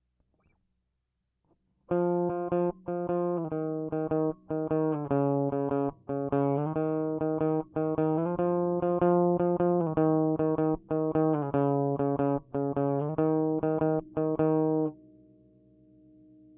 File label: Lead